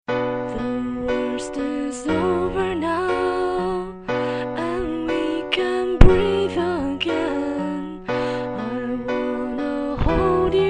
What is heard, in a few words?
piano